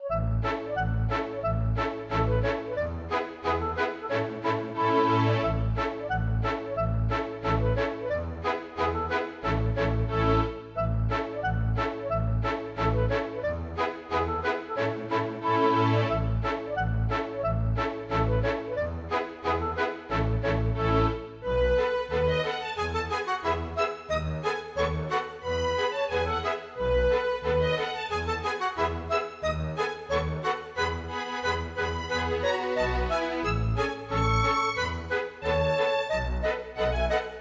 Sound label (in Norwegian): Polka; storgårdsmusikk